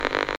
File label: Interference